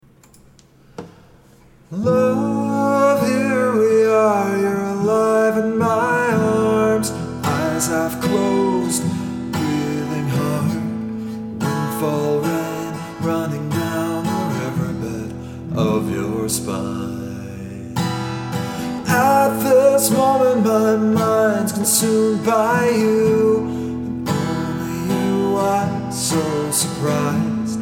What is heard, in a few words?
large vocal reverb